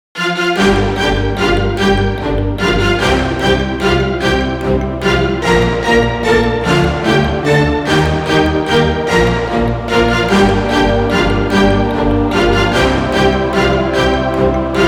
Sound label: chabad orchestal